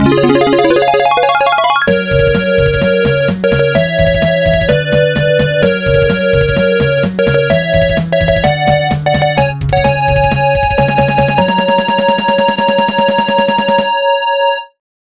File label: Victory